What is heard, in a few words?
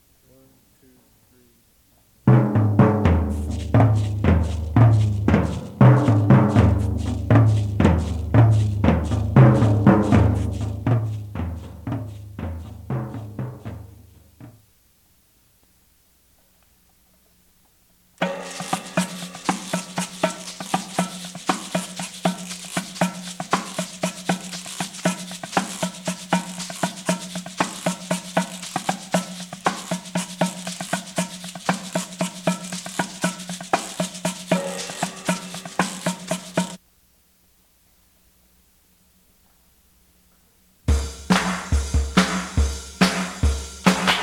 Drum Stuff